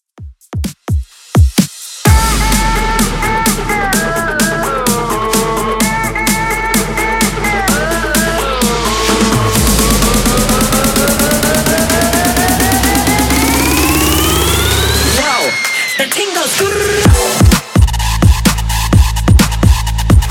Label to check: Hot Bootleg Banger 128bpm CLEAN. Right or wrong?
Right